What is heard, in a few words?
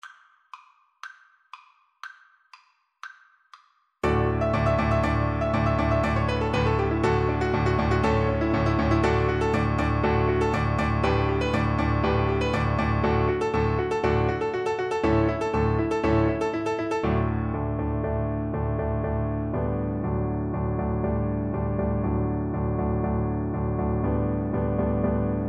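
VLC karaoke, Cello; Capriccio Espagnol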